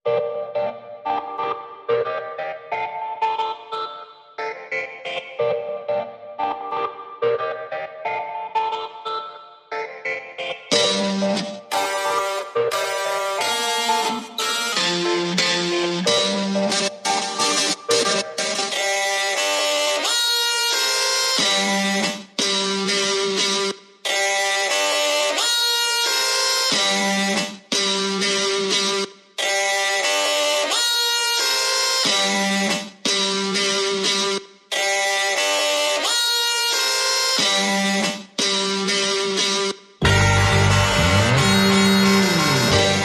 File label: Glam Squad No Perc